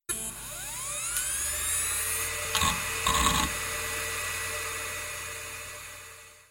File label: start hdd